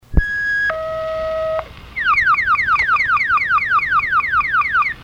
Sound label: plectron; Unknown low-band agency alert 2, G8 encoder